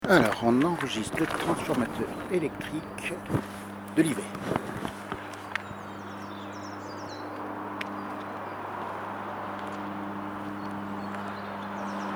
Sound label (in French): harmoniques transfo, les ondes de l'eau